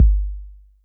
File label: Kicks